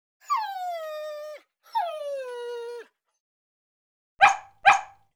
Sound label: Fenrir idle; Seer; GamigoNA